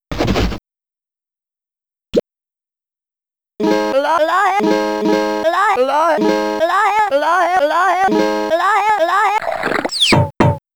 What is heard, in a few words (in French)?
Chanteur avec luth